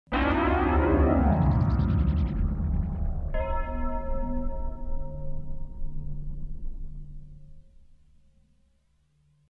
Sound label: terror twang